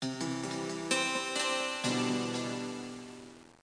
sting

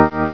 roulette open up; Boxcars Casino

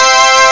juno str. br. maj; Epic Collection 3, The; Epic Marketing; muzak

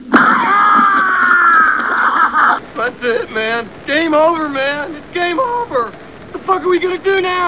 doom die; blodclot

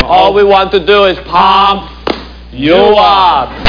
pump up, tv

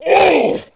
SHOCK; Messiah

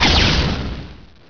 Staff Unready, Weapons; Heretic II Demo

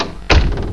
Door Open Close; Mythos

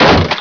collision lg; SpecOps2 MPlayer Demo

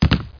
wood land; HereticII Demo; Player

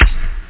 dance kick; OctaMED SoundStudio V1; jazzies samples; perc

